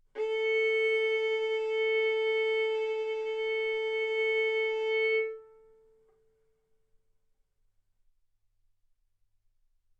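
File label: Violin arco mf A string A4 B4 stereo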